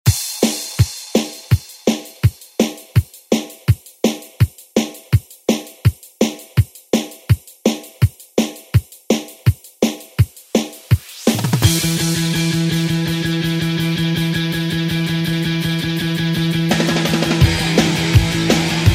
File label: Re Drum